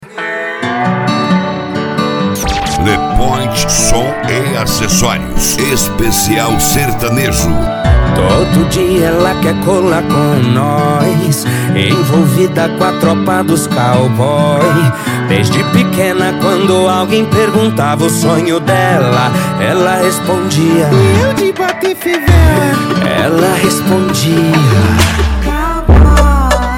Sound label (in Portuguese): sertanejo